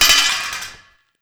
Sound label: Scaffolding Collision